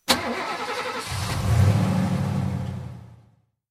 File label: Car Start; To the Moon